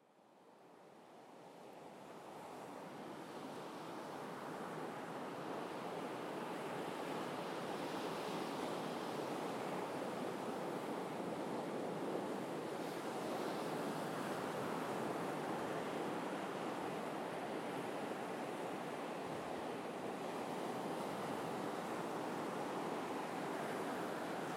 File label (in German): warnemunde strand